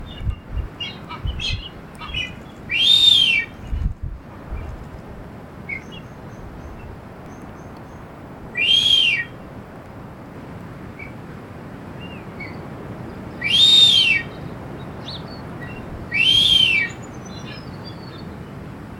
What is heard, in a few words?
Masked booby